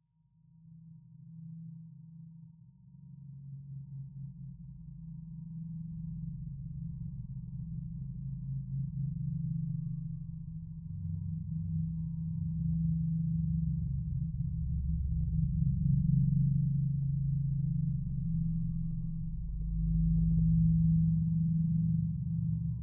Drone Sci Fi Abstract Textured Tonal Ghostly; skyes audio

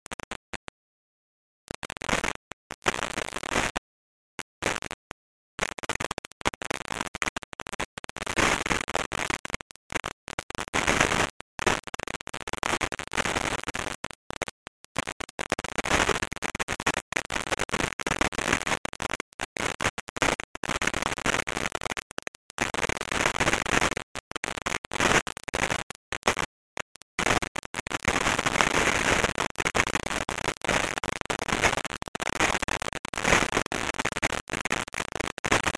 Static Radio Interference; bottlerocket

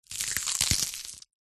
horror bone break hand stand on crush

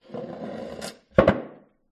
pub wooden bar stool scrape on tile floor; field reports

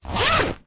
tent bag zipper fast

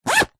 office pencil case zipper fast

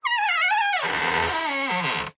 Wood Stress Creak Squeaks; DPA4060; pmsfx

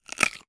human bite cucumber

